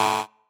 marimba bike

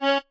marimba chainsaw; ゆめ2っき

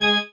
marimba grave; ゆめ2っき

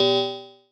marimba; ゆめ2っき

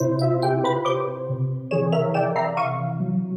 BGM, Music; ゆめ2っき

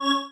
marimba spacesuit; ゆめ2っき

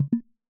walk; ゆめ2っき

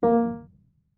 marimba piano; ゆめ2っき

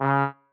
marimba trombone; ゆめ2っき

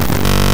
Glitch

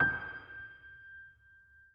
marimba; ゆめ2っき

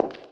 ashioto room small

